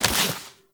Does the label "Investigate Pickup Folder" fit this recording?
Yes